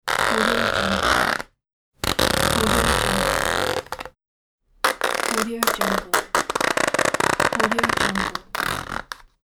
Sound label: scraping plastic